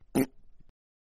quick fart

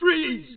freeze sound

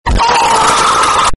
loud scream

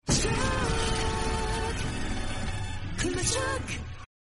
shock; kuma

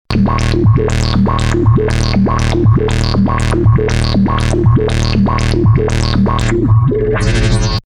beat plug out

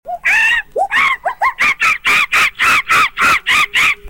monkey noise

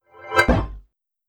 bonus whip

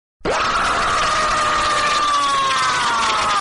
screamer

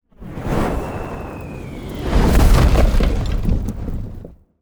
small meteor falling

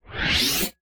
lightsaber sound swoosh

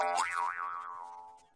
Cartoon boing sfx; Meme Sound

